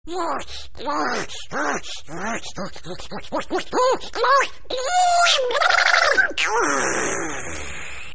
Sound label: Duck Going Crazy